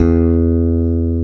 E-BASS E0; Best Service; Alpine Volksmusik

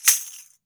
JINGLE CLAP, JINGLE BELL

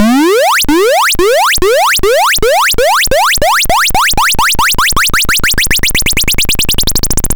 Apple II FX Invader Liftoff, Chiptune

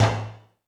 Toms 1, drum machine

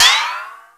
SYNCYM, TECHNO; 90 sSampleCDs; Crypton - DrumBase5000

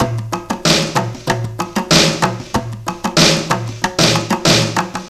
ETHNIC DRUM 94

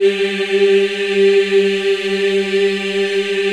G3, Choir; USB Soundscan vol.28; Acoustic & Synth; AKAI

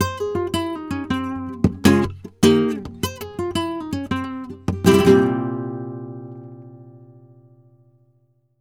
flamenco guitar, tango; Best Service ProSamples vol.46 - Flamenco

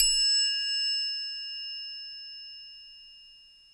Triangle Tone, Asia; Sonic Foundry (Sony Creative Software); Continental Drift Aftershocks

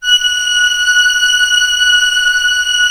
ORCH C6 PP, Orchestra; Sound On Sound; Peter Siedleczek's Orchestra

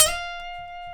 G, funk guitars

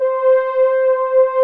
JP ANA PADS; Pro-Rec - Synthsonic; JV ANALOG1

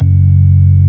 ORG B3 F#1; Best Service Gigapack 2

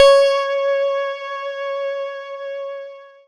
C#, Synclav FM PLUCKED; Big Fish Audio

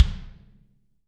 BD, Real Mega Drums

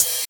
Open Hhat, HiHat; Equipped Music; Loungin House

